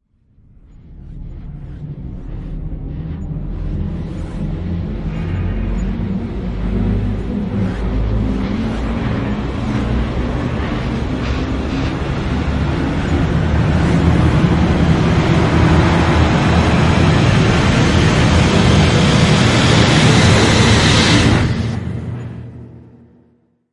Rise

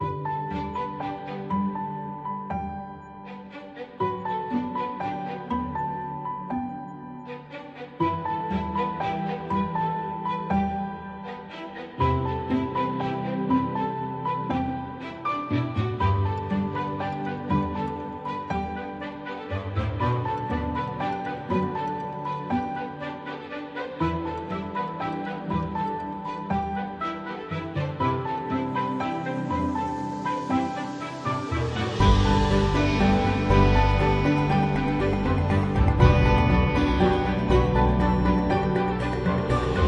Fresh Ambient

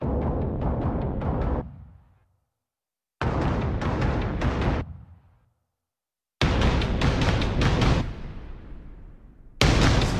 Protect the Asset (Drums)

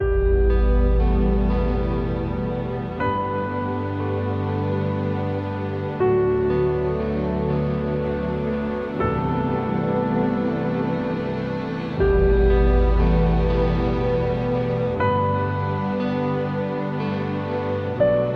Importance Ambient

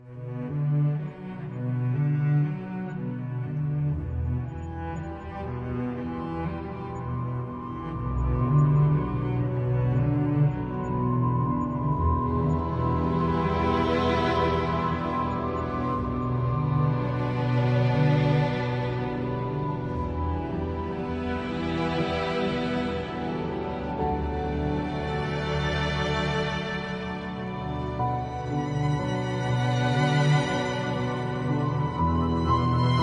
Longing Ambient

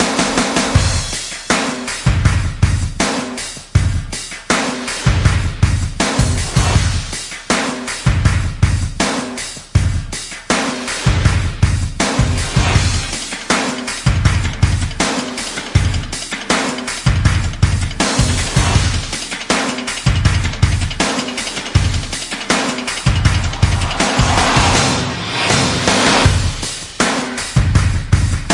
drums remix

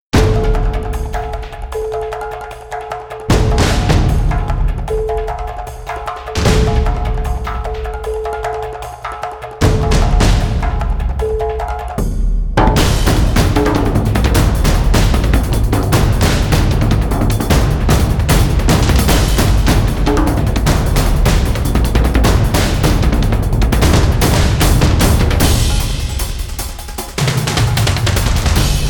Percussion

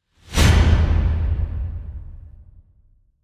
Grave Whoosh; Gothic Storm Music

